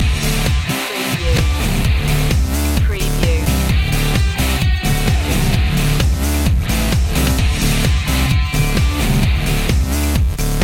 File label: 80s revenge loop